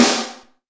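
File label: snare drum, Real Mega Drums; Best Service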